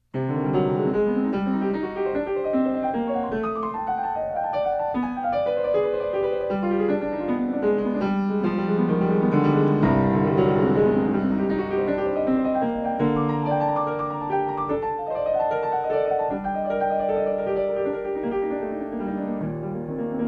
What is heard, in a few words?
piano